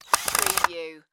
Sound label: Electric Drill Tight; Sound Effects Bible